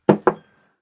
Glass Put Table, Glass put down on wood table or bar.; Bars; Glasses